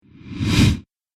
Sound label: Fast Deep Whoosh; Whoosh Sound Effects; sound design